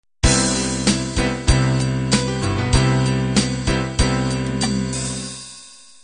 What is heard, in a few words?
rock ballad intro